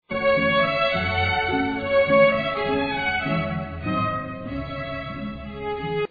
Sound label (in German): walzer